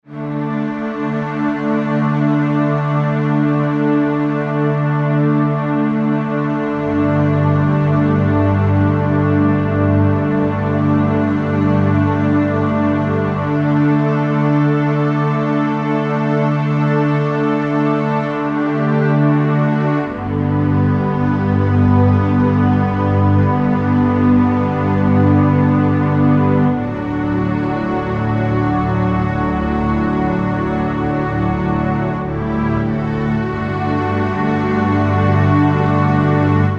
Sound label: Underscore PAD; Worship Flow